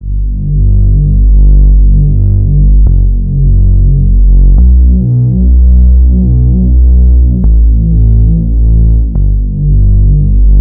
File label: Synth loop 105 Bm, Synth Bass Loops; Slate Digital BASSHOLE Sample Pack